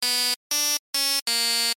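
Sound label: nokia notification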